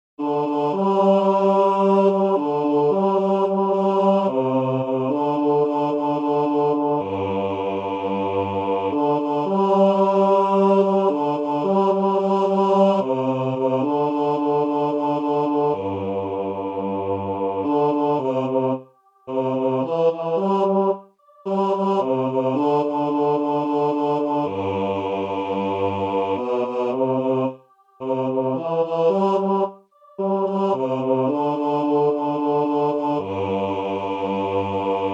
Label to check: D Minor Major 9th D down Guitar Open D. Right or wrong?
Wrong